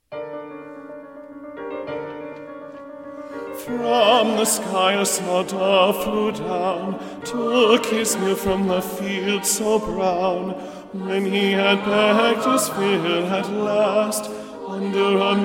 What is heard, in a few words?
Bass English